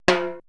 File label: 80S DRUM SET